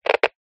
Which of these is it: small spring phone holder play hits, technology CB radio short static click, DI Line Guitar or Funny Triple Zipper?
technology CB radio short static click